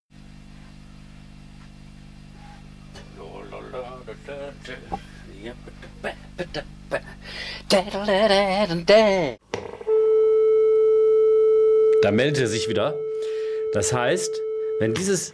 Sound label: Jingle; SooperRadio; Die Meldung; Radio Woltersdorf